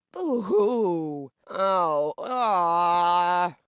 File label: Woman Booing; voice; Zomboid; Lifestyle